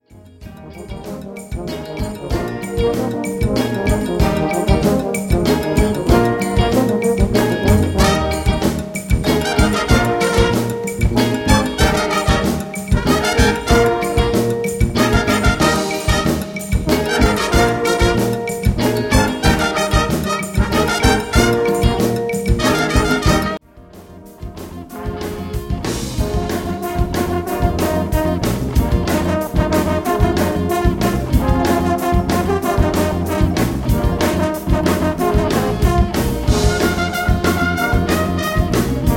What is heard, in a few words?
drum